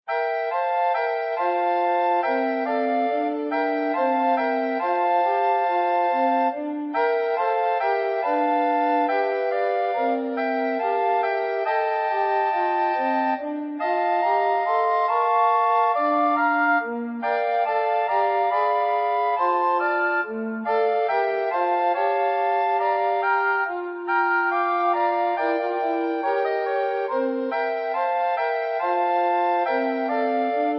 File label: SATB Recorder Quartet